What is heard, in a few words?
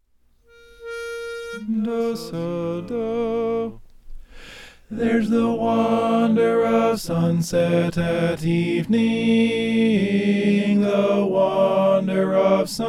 the wonder of it all tenor